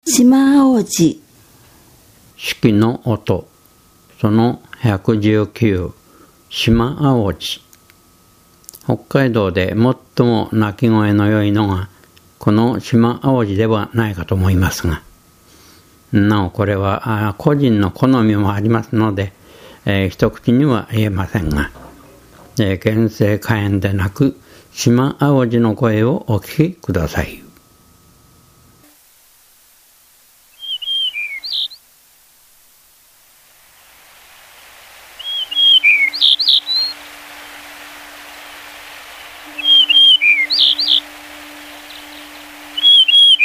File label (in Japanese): shimaaozi, birds; sikioto